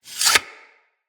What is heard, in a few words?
Dagger Sheath; Weapons; Dual Daggers